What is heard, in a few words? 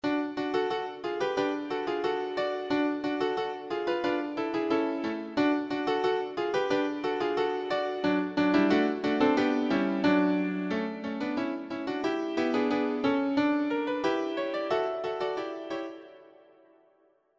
audio sample piano